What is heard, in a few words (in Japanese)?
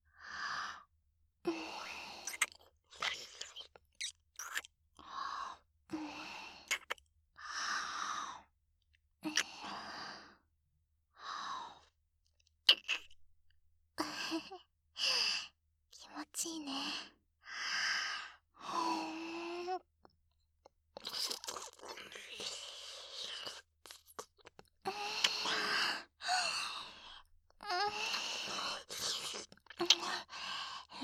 キス; SEなし